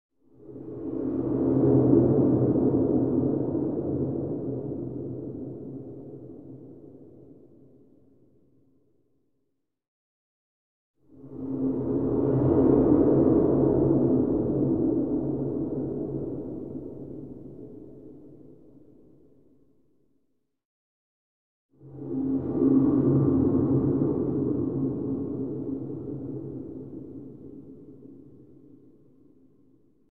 Whoosh - Distant deep well tone w tons of reverb x3; Hollywood Edge Sound Designers Tool Kit